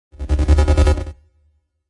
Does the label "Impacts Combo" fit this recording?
Yes